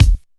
rich top end kick drum single hit e key, steel kick drum tuned to the E note